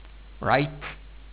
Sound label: write